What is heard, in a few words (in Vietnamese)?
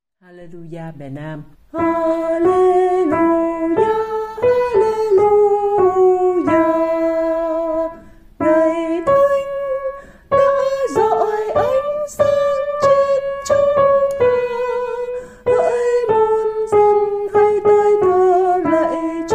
Halleluja Nam